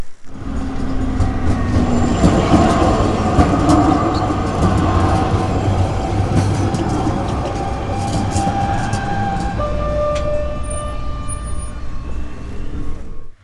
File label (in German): strbahn